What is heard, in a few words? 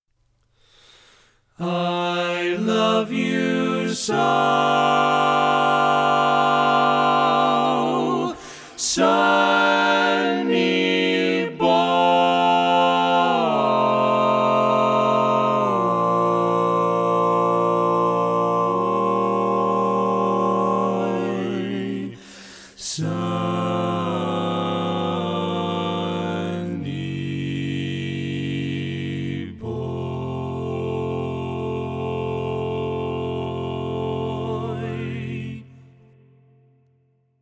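Baritone, Barbershop Tags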